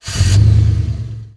motor engine start

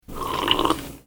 hot sip drink

